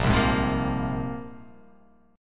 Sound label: A sharp 7th Suspended 2nd, guitar chord